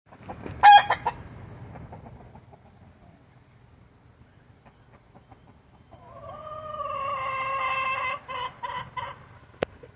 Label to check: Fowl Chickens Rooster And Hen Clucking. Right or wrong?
Right